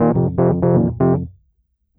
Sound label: E. Piano